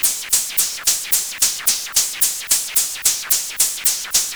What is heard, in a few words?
MiniBrute 110BPM, Gated Seq Noise